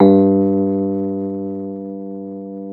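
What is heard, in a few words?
AMP FENDER G2, Keyboards Old School; USB Soundscan vol.09; AKAI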